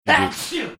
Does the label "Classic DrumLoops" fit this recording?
No